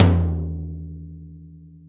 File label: gretsch tom, deep house single hits